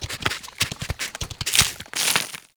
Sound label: Truffle Dig Foley; Warframe; Infested; Juggernaut; Truffle Hunt